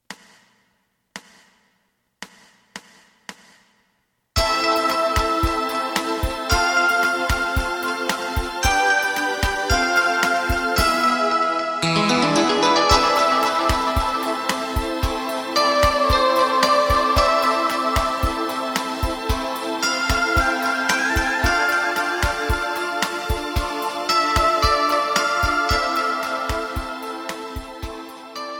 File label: bass; エレキバンド用カラオケ